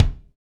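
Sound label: bass drum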